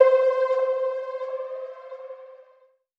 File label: SYNTH (C); One Shots; Elevator; Cymatics; Mystery Pack Vol 4